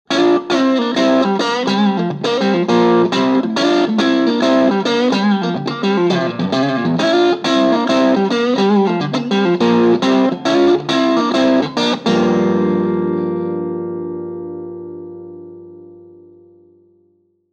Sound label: Crush Crunch